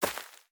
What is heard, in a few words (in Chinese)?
垂根踩踏声